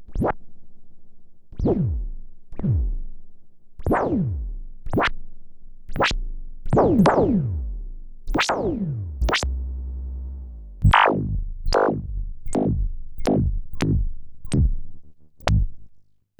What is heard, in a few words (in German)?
ACL System 1 Perkussion